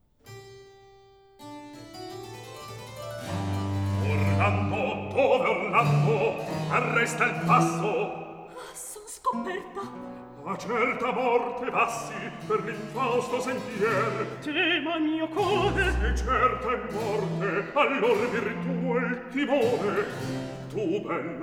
Recitativo; Scena 7; Astolfo, Angelica, Orlando; Antonio Vivaldi (1678 - 1741); Vivaldi - Orlando furioso (2004); Atto II

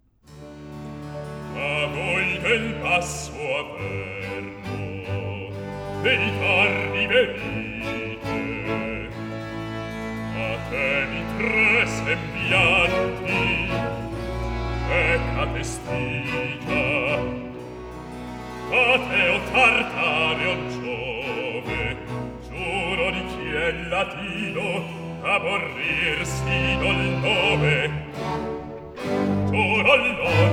Recitativo Accompagnato; Atto 1 - Scena 1; Tito, Decio, Servilia, Lucio, Manlio; Antonio Vivaldi; Tito Manlio (2005)

